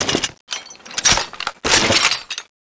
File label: reload, gau